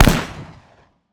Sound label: sfx sniper; The Day of the Infected